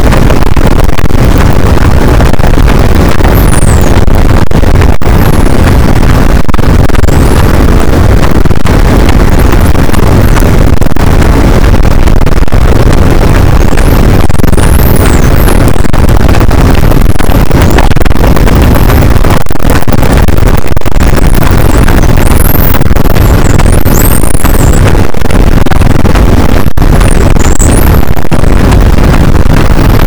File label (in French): cachalot positif, boat; Physeter Macrocephalus